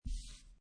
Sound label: Playing Cards Poker Dealer Button Slide On Felt